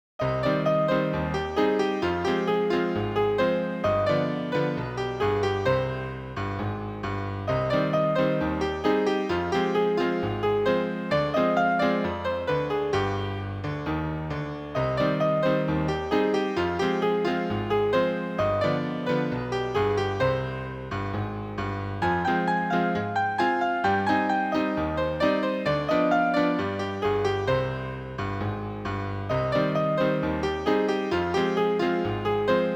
Tango Solo, Ragtime

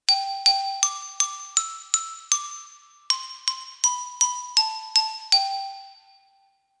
Twinkle

